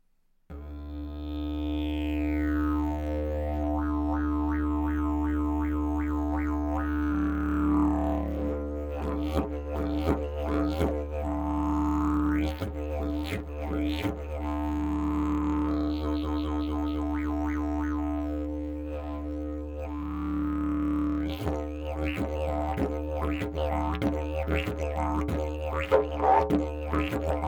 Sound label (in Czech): jilm podzimni, didgeridoo